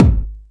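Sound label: bass drum; 300 Drum Machines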